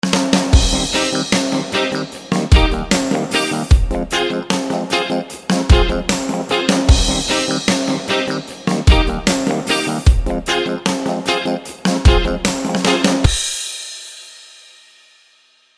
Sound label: reggae ringtone